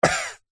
human male middle aged cough; zapsplat

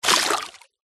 fishing net splash hit water fast

